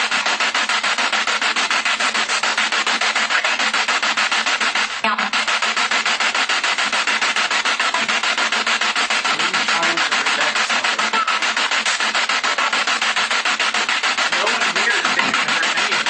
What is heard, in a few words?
Kitchen Spirit Box